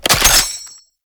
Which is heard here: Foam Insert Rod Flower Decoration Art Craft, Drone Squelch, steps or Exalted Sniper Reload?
Exalted Sniper Reload